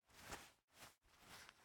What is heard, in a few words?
Take Bomb; raw game audio files; Mita; MiSide